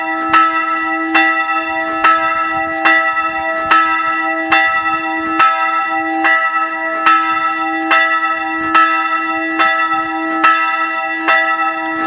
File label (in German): Glockengeläute